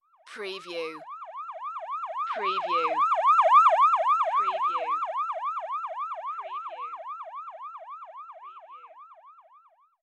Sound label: Ambulance Siren Pass By